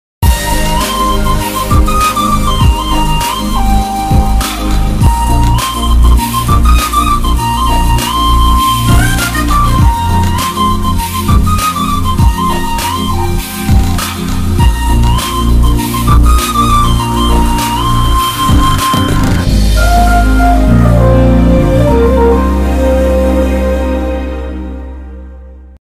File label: mass bgm; ashwin kumar